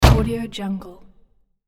door close sweetener; hollywoodedge

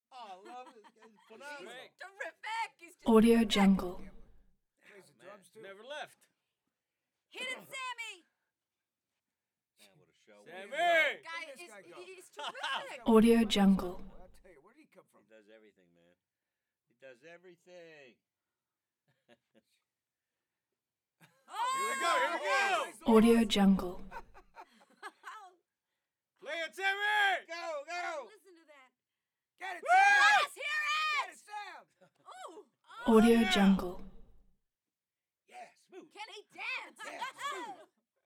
showroom crowd mixed group of adults

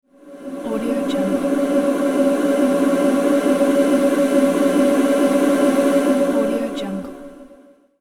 windy wave

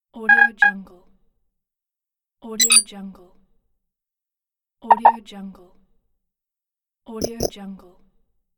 drained mobile battery notifiers

